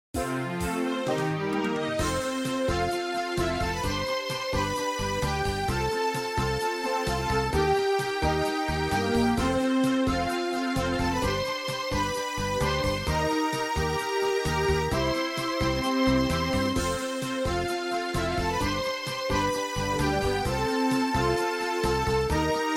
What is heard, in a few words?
MIDI Ship